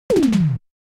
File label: 80s Toms, notifications Retro; Samsung Galaxy S24